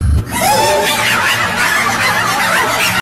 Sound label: Klown Laugh